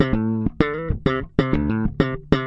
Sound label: super slappy bass; BASS LOOPS